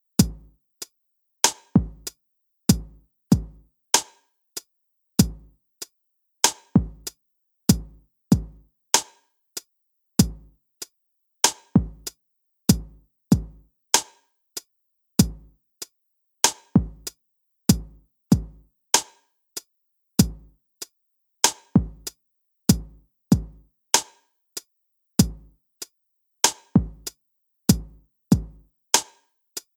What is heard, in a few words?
only bass